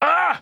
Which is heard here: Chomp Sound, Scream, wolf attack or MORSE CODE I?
Scream